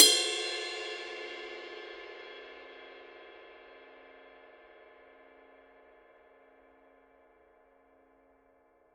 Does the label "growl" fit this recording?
No